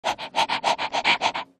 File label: dog